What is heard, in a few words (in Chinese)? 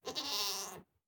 山羊待机声